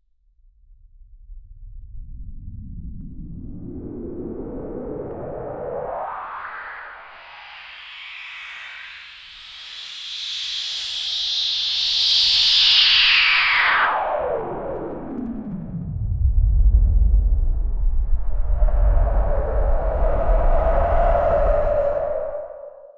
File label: whoosh flick green warps